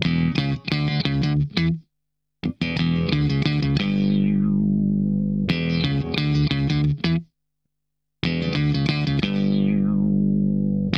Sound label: SHOT, Funk Guitar; 90 s Sample CDs; Zero G